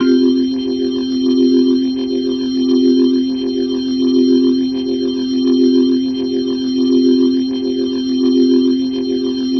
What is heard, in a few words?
Glowing Organ C Sus; Ambient Cinematic; Organ Licks